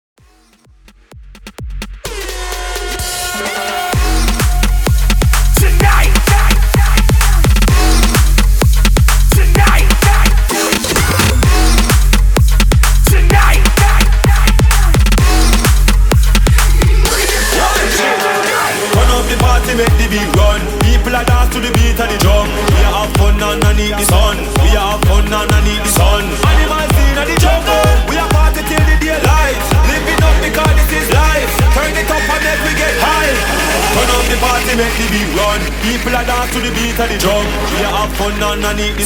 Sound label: 128 BPM